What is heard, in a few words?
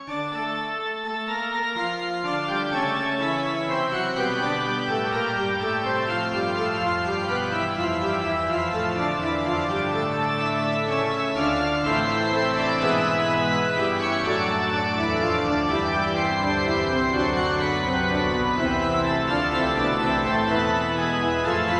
chapels baroque organ